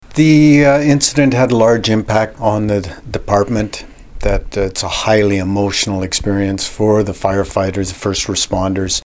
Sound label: Tom